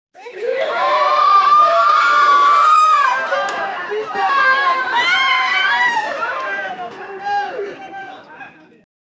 medieval fantasy elven ho, party

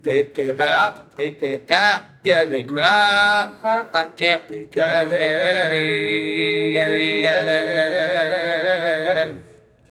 vedic chants

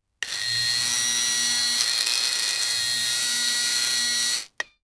electric coffee grinder, appliances

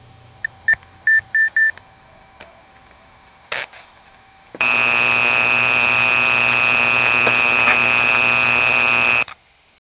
loud click sound as the, control panel

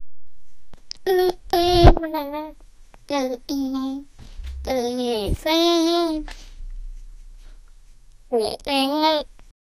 latex materials suit

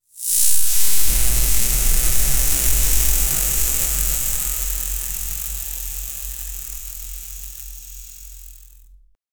short crackling electric; scifi

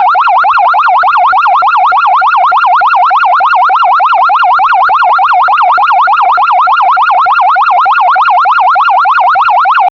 retro sci fi emergency si; non-melodic

